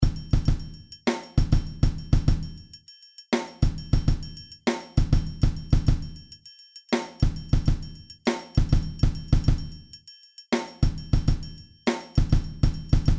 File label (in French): Orchestre Intro Percussions